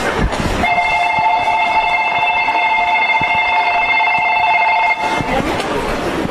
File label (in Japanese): Saikyo, ベル; 埼京線・川越線